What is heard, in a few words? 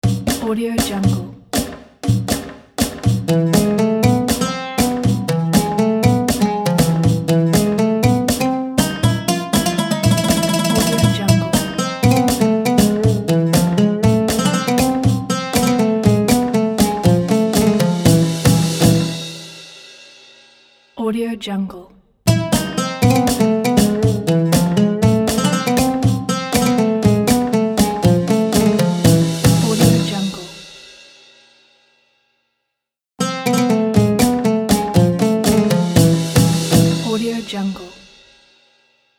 ramadan arabic logo